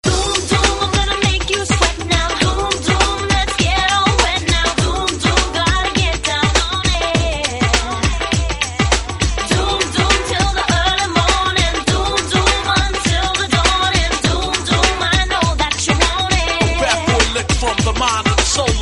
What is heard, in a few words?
Theme Ringtone; Dhoom